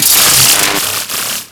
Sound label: Ocean Electric Surge Large